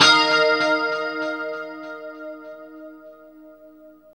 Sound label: D#5, Guitars; Spectrasonics; Hans Zimmer Guitars VOL-1; JC-SHEEN